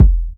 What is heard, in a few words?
BD, B.DRUM, House Side Of 2 Step; USB Soundscan vol.51; AKAI